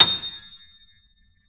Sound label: A 6, Keyboards Acoustic, GRANDP ST; USB Soundscan vol.17